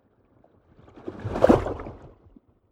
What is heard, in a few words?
whoosh abstract small